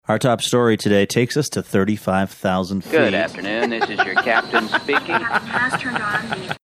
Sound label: laughing our top story today takes us to 35000 feet